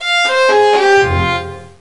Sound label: Gothic Lose; Mystery Tales: Time Travel; NDS